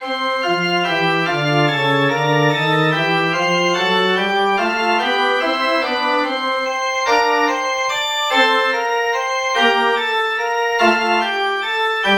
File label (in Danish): forspil